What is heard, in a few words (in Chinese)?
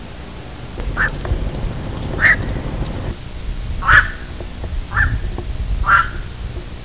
夜鷺